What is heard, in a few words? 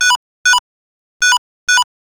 Toy Beep Electronic; Digital; Multimedia Internet CD-Rom Flash